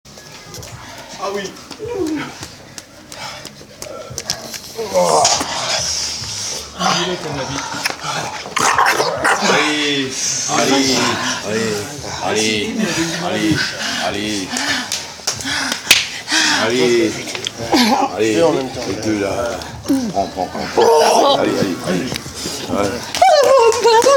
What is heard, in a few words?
Vocal